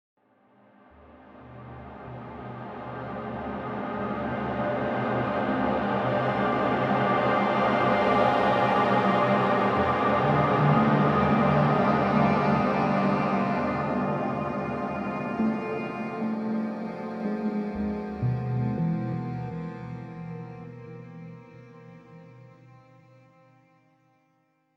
Clock Tower; missing the j.j. macfield and the island of memories

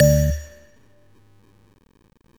Train

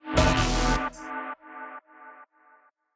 Wrong Answer; Astral Chain

